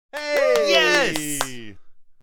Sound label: Man applause and cheers